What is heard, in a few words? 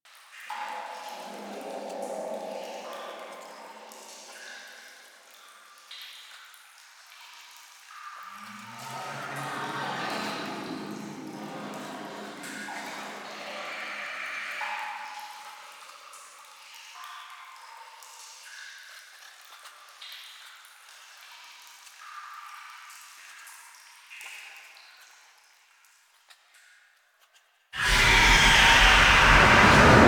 Cave Descent Distant Sentients; Warframe; Veilbreaker; Kahl